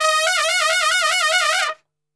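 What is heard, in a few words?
D# trumpet shake; Best Service; Brass Super Section; TRP SHAKE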